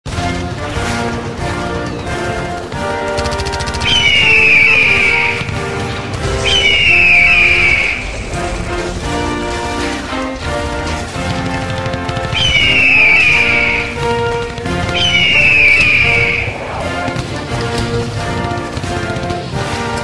usa anthem but with gunshots explosions and red tailed hawk bald eagle